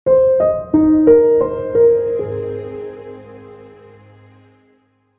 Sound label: logo motion